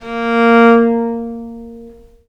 A#2, Historical Instruments, VIOLE MUT; USB Soundscan vol.23; AKAI

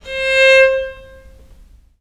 C4, Historical Instruments, VIOLE MUT; USB Soundscan vol.23; AKAI

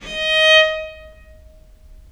D#4, Historical Instruments, VIOLE MUT; USB Soundscan vol.23; AKAI

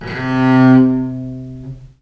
C2, Historical Instruments, VIOLE MUT; USB Soundscan vol.23; AKAI

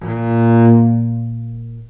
A#1, Historical Instruments, VIOLE MUT; USB Soundscan vol.23; AKAI

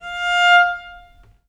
F4, Historical Instruments, VIOLE MUT; USB Soundscan vol.23; AKAI

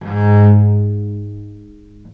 G#1, Historical Instruments, VIOLE MUT; USB Soundscan vol.23; AKAI

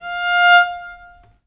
F4, Historical Instruments, VIOLE MUT; USB Soundscan vol.23; AKAI

